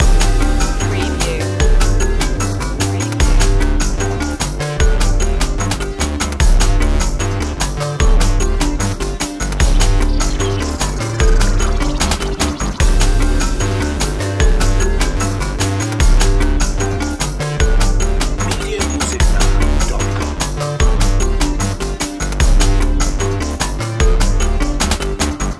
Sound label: digital clockwork loop